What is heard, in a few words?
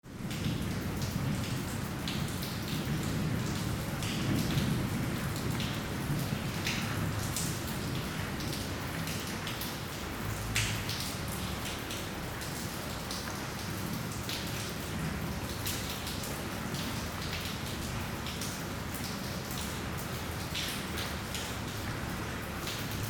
rain on an unfinished house